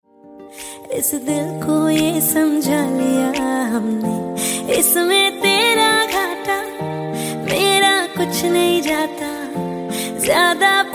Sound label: female version ringtone